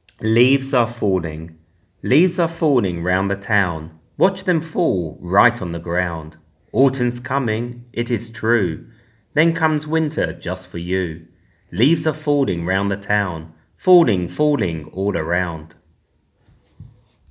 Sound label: Leaves are falling